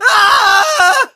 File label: Scream Male; friday the 13th killer puzzle